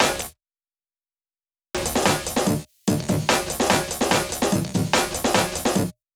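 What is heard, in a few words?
snare with foley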